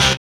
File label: dance chord